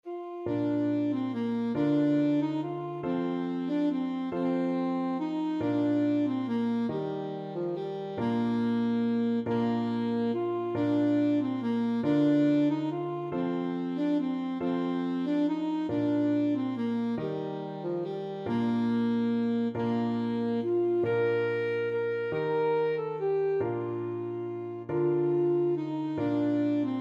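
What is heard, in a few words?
alto saxophone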